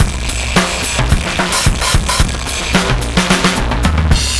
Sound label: Drums Of Doom 109 bpm, breaks; Zero-G Beneath the Planet of the Breaks